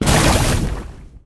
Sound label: basic fire; Smite; Sol